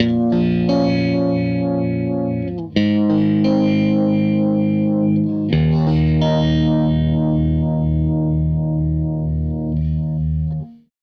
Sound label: Tele Guitar Loop